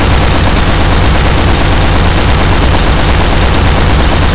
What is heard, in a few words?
machinegun shoot, weapons; tf2classic